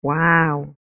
waoo

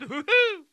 woohoo

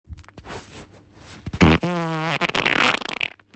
fartin badly

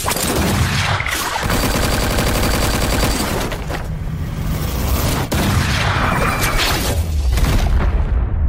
sfx

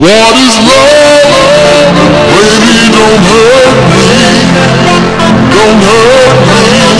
slowed bass boost

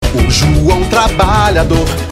jingle; joao doria 45 trabalhador

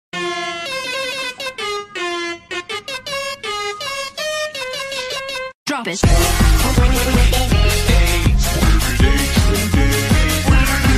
follow sound alert sound and donation sound; twitch

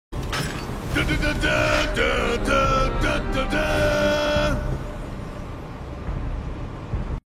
fanfare; ff7r; barret

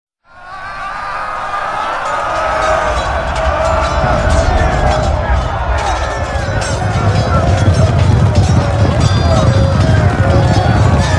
battle sound

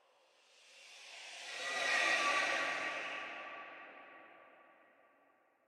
xeno birth screech

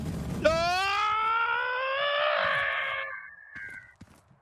yell trail

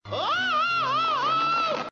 oowoowowowo

